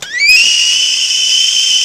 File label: whistle, Tea Party; SFX